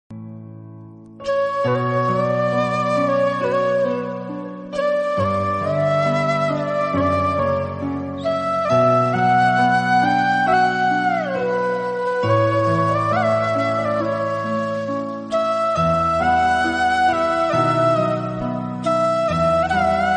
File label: flute instrumental